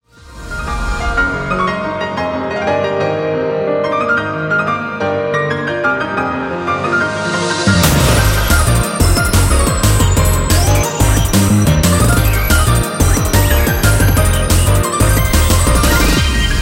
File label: Beats Flow